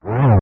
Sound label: Boss Hit; LTTP